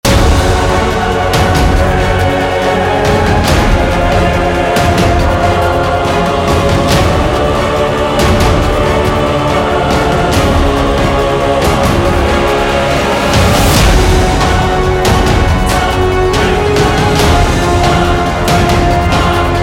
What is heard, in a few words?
Loop Action; Volume macos ps vita ps4 windows gamerip 2015